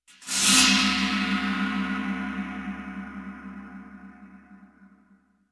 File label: Scraping Gong; Halloween Havoc - Sound Effects; Ktel